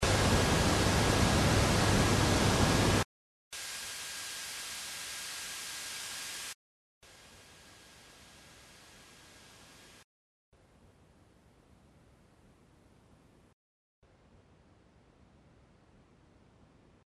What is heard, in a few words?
flyover ahead